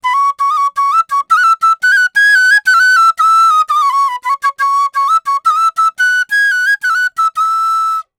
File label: Flute Loop E Maj 115 BPM; Gully Loops; waves of bihu vol 1